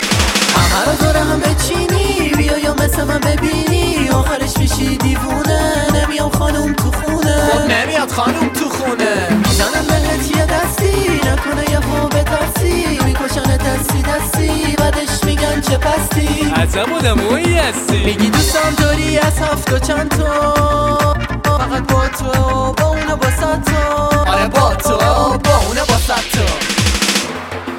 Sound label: echo